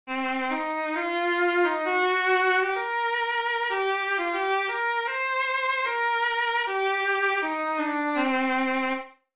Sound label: Fiddle